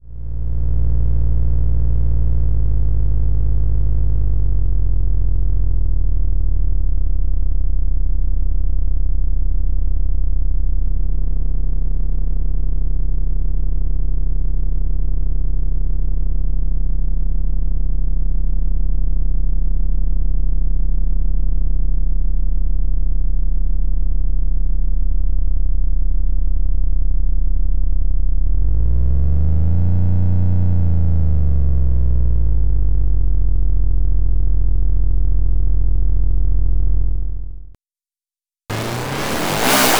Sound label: engines